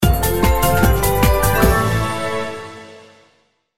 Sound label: victory; paoPaoLong